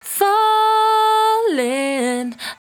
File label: 68bpm D, Velvet Vocal; Zero-G